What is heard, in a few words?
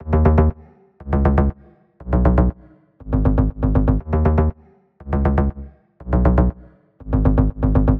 Bass, Dancefloor Pop; Remixlive